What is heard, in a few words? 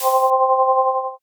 shield up